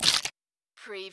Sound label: CARTOON SQUISH